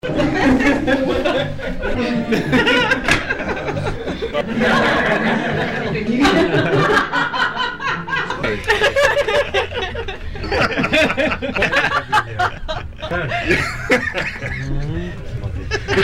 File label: laughs